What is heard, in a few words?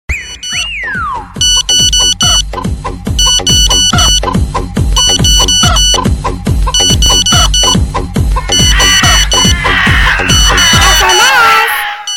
mix animals song